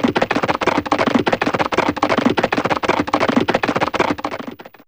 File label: GALLOP, ANIMALS; Fairlight CMI IIe